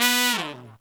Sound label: Pop & Funk Brass, TRP TEN FX; Best Service ProSamples vol.25; AKAI